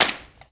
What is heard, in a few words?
leather bash flesh